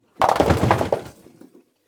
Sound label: 10-Pin Hit Close-Up, Bowling; SoundBits